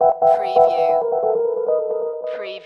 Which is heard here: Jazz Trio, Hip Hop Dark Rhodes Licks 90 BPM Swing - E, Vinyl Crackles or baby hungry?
Hip Hop Dark Rhodes Licks 90 BPM Swing - E